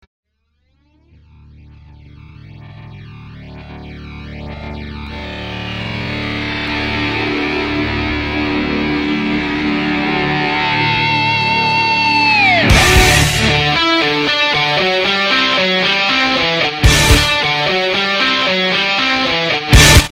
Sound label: hard gitar melody